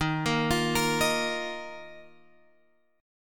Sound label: Eb Suspended 2nd down Guitar Open F; Ebsus2 arpeggiated; JGuitar